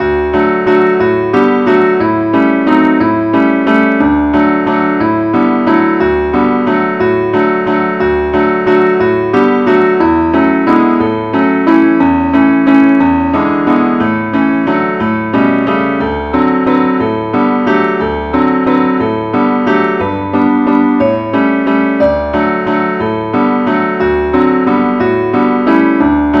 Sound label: advnceacc key d; angelvoices